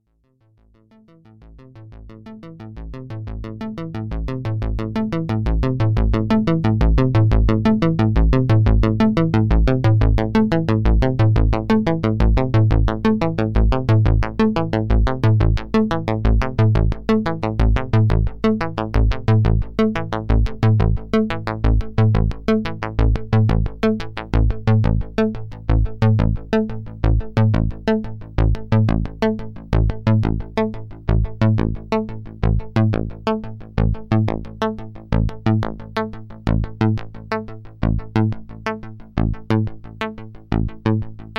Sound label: A110 PWM A155 Sequ